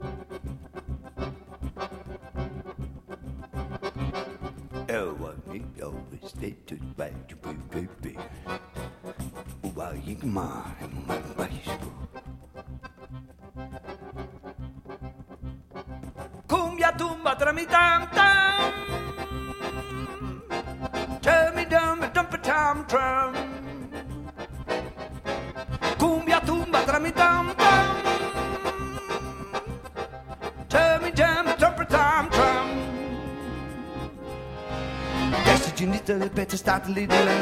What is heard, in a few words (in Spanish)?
cumbia